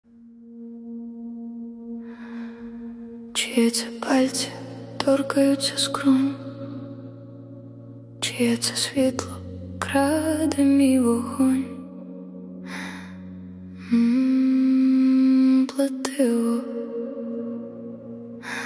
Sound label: ukrainian ethno pop